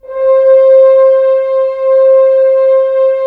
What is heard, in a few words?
Horns; Roland - LA Composer vol. 4; HZ Horns